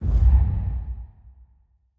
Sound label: UI Map Swap; Devour